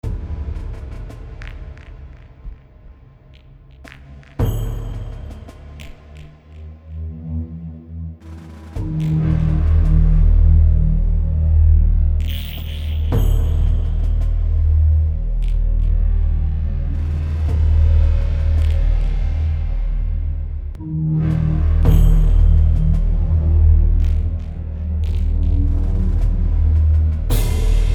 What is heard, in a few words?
C15 Cinematic; a high number of unison voices; Using the macro controls is a must for unlocking the special character of these sounds!